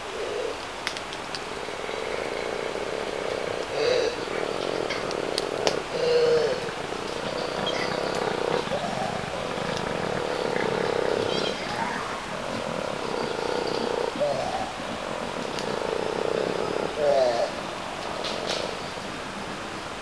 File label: Male Koalas, koala bellow